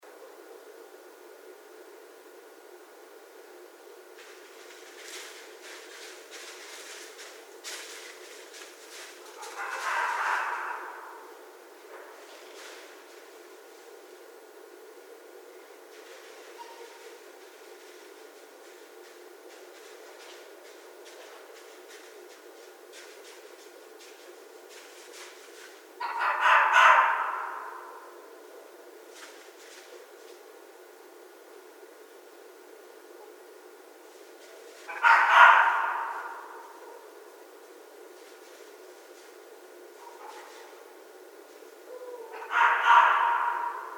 Red Fox

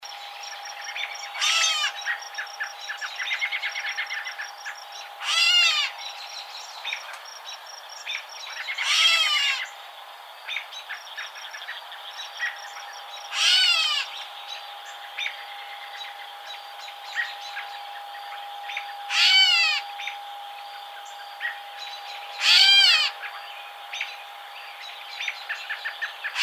Gray Catbird

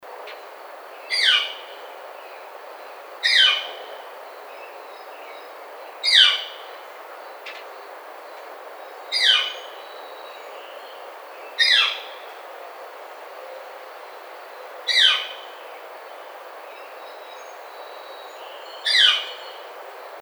Northern Flicker

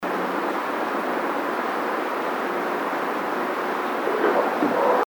Mumble, bigfoot

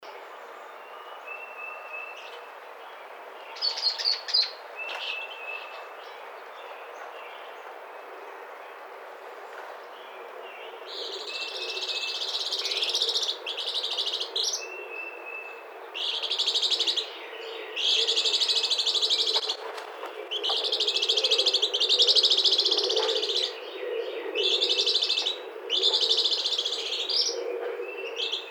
Eastern Phoebe

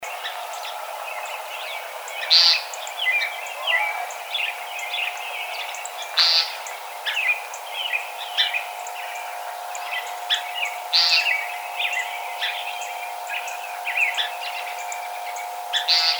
Common Nighthawk

